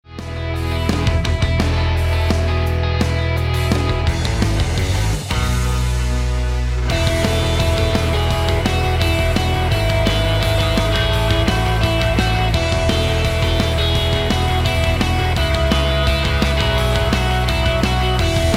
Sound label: Beautiful Guitar